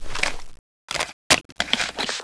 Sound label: aqua blaster reload; zmall